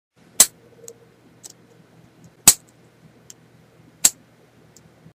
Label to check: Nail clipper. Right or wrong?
Right